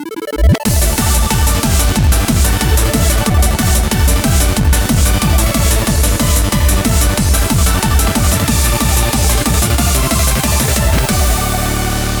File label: opening bgm; BeatStream